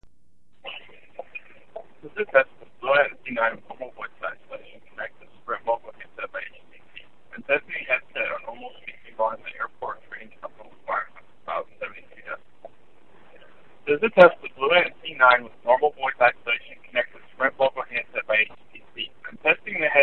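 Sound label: Airport 72db, headset; BlueAntZ9 normal; Mogul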